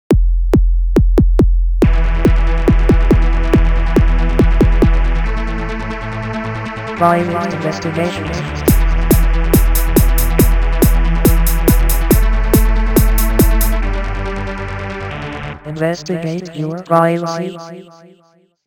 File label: jingle; congress radio